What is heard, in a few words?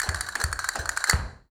CASTANETS, Flamenco